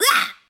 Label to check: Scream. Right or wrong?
Right